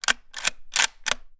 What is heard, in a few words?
weapon gun savage 10 .300 blackout; still north media